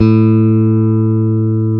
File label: GTR, Dual Guitar; Club-50 - Foundations; Paul